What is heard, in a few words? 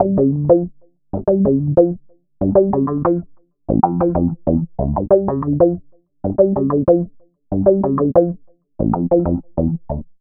Filtered Funky Bass, Electric Bass; Sonic Foundry (Sony Creative Software); Leo Cavallo Bass Taster